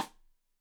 Side Stick Stereo; Deeply Sampled Drums; SMDrum

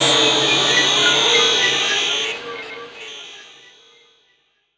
loop; X-Static Goldmine 3

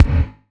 Kick, Gated Reverb; musicradar

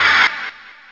HIT, HOUSE

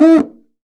Cuica Up, Perc; Akai MPC500; LA Perc

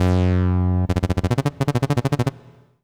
TOP BASS

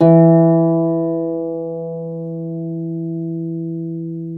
Troubador Harp

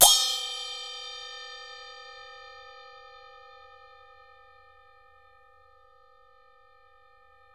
HSIAO CHIN, CHINESE CYM; AKAI S6000 CD-ROM; WorldSounds

